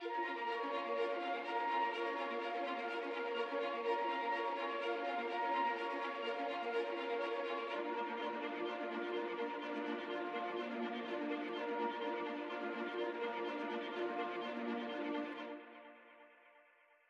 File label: music interactive; World of Tanks